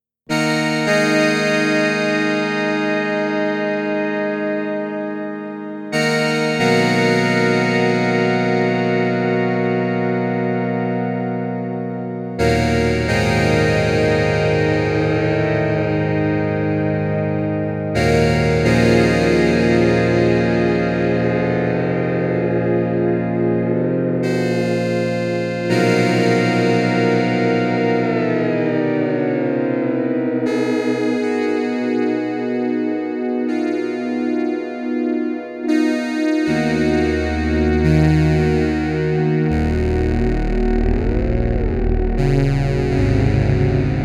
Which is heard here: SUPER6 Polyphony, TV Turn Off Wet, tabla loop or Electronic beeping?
SUPER6 Polyphony